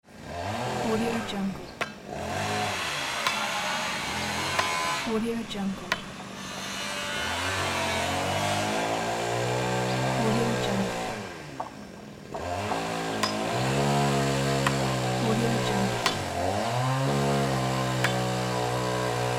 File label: construction wooden house